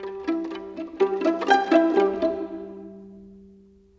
Violins Pizz, Cinematic; Sonic Foundry (Sony Creative Software); Orchestral Series VOL-3

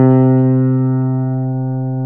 CP70

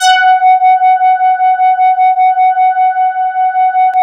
MEMORYMOOG F#4; Big Fish Audio - First Call Vintage Keyboards

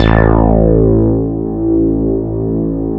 SH 1000, BASSES

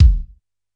A1 Bass Drum, Yamaha PSR-E323 Symphony Kit

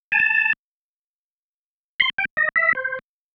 Single Riff, Organ; Loops; Single Riffs; Sonic Foundry (Sony Creative Software); Joe Vitale Organ Donor

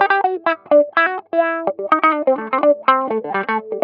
Guitar Wah Loop, 125bpm; Zero-G; Nu Disco

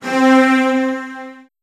C3, Virtuoso Strings, 16TH; ILIO; Kirk Hunter; CL 16TH

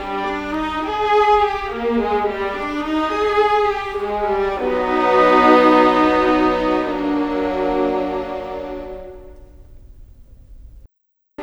Cinematic Viola Violins; Sonic Foundry (Sony Creative Software); Orchestral Series VOL-3 - Cinematic

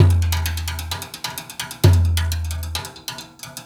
Dumbek; Ambient Grooves; World Groove

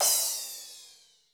TECHNO; 90 sSampleCDs; Crypton - DrumBase5000

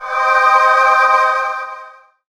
pad, Dance Synths; Best Service ProSamples vol.37 - Dance Synths

